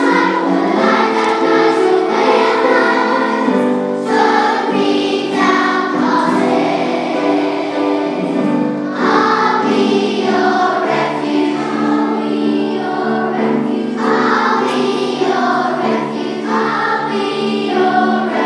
choir singing

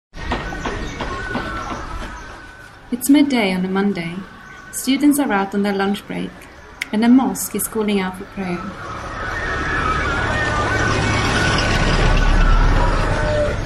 dakar in sounds

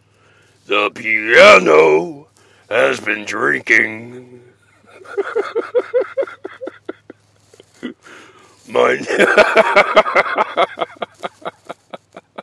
my failed attempt at a song too busy laughing

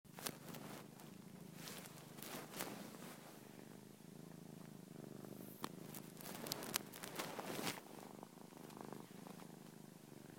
purring; keegan

